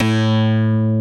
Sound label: BASS; Masterbits; Dance Trax